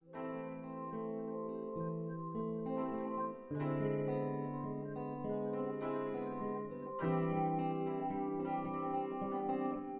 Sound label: no drums synth conv 5cm sim; SASSEC07